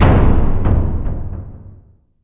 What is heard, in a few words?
clank; Earth and Beyond; EA Games